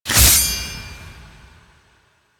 Sword Through Release, Forest Night; Warframe; Sacrifice; Ballas

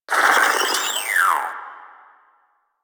Proto Infested Maggot Awake, Vocals; Warframe

